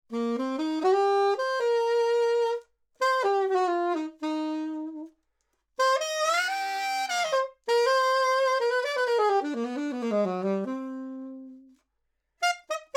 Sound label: Sax Alto 50cm R Mid